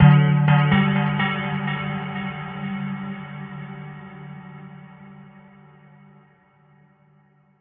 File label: bell echoes, synth and electronic loops